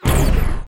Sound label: plasma gun